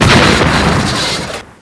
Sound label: jeep death; Army Men TiS